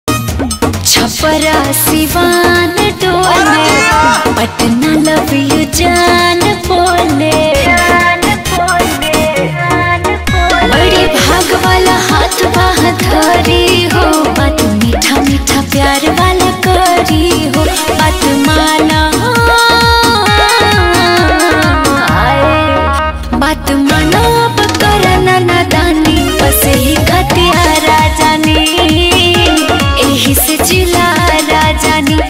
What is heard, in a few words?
bhojpuri ringtone